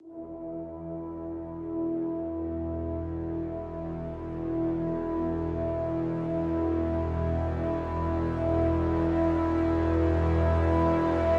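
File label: Crescendo Brass Swell Full (E)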